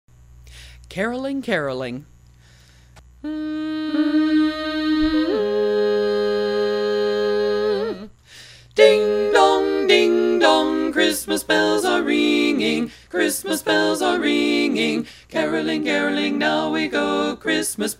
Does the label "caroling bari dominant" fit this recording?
Yes